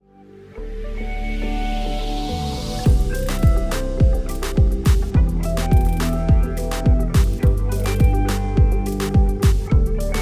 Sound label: Party Pop